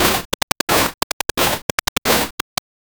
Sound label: basic footsteps loopable, pixel art